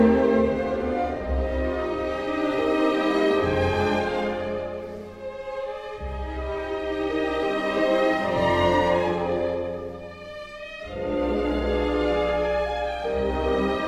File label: Easy Sightreading, violin excerpts